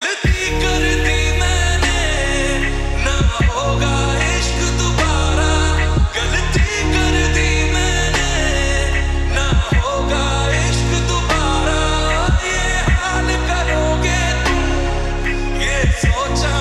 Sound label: Lofi Mix